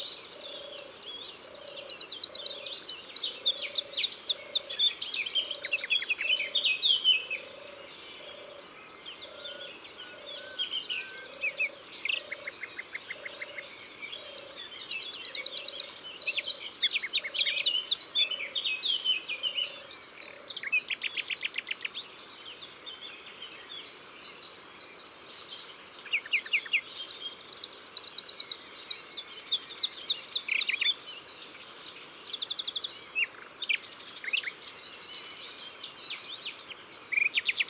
Troglodyte mignon; Concerts Naturels de la Drome; Studio les Trois Becs

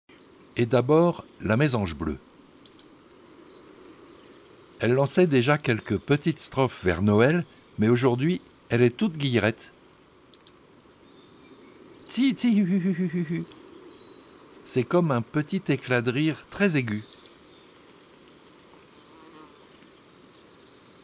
La Mesange bleue

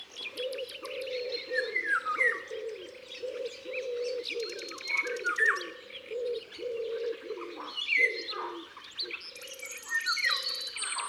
Pigeon ramier ABC des chants d'oiseaux

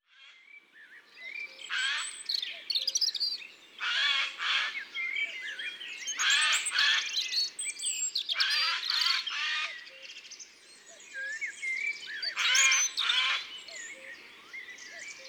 Geai des chenes; ABC des chants d oiseaux

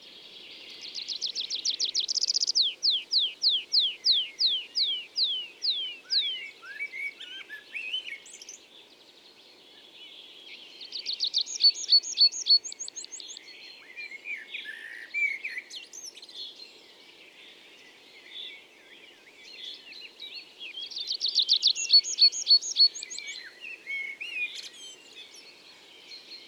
Pipit des arbres, Concerts naturels de l'Isère; Studio Les Trois Becs

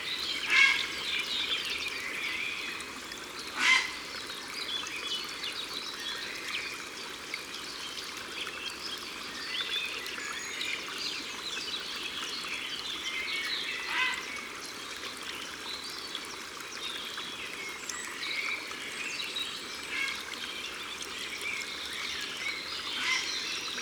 Heron cendre, Au bord de l eau

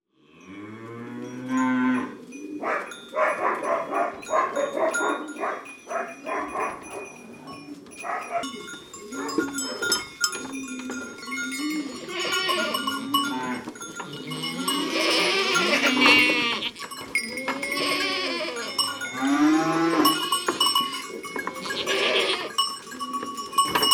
La sortie des bêtes, Ambiances Sonores d Ardèche; Saint-Michel-de-Chabrillanoux

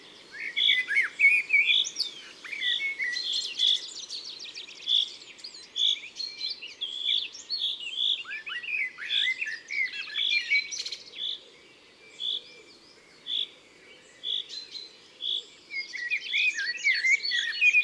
Merle noir; Isere; Concerts naturels de l'Isere; Studio Les Trois Becs

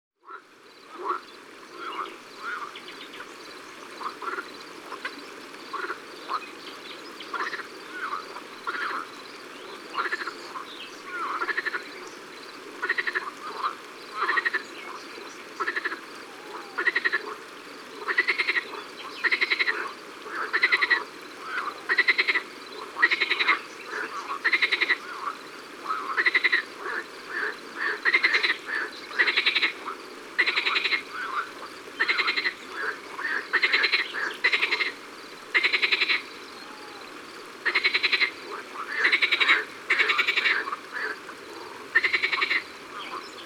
Grenouilles vertes la nuit Lalevade d'Ardeche; Ambiances Sonores d'Ardeche; Studio Les Trois Becs

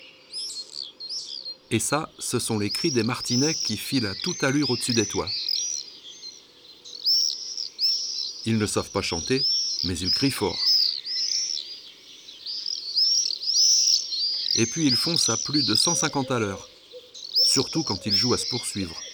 Martinet noir ABC des chants d'oiseaux

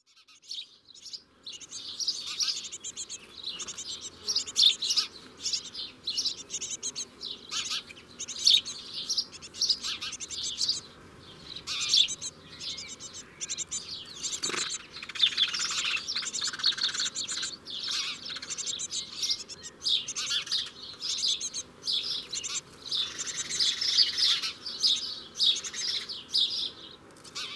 Moineaux et jeunes mesanges charbonnieres au nid; Gourdon; Ambiances Sonores d'Ardeche

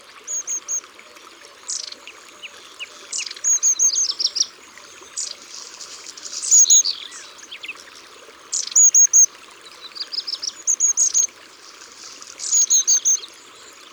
Mesange a longue queue; abc de la nature

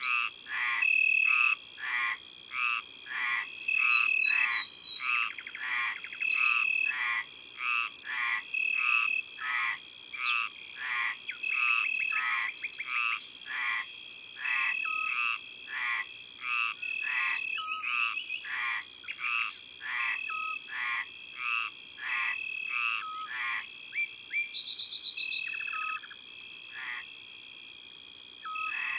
Grande sauterelle verte; ABC de la nature

